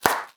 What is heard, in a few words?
impact; dota; marci